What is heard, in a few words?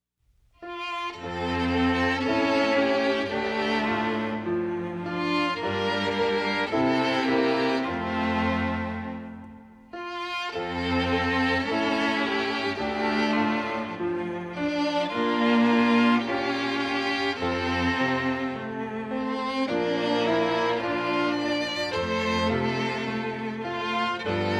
music only